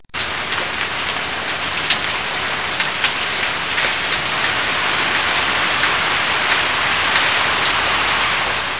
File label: nature